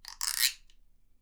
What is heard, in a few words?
GUIRO; Miroslav Vitous - Percussion & Harp